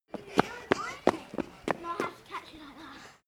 kids playing running

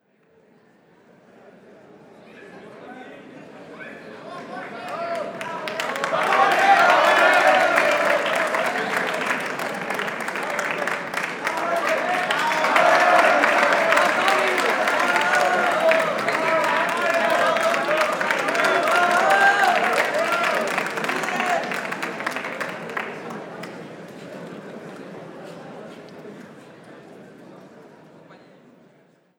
applause bravo

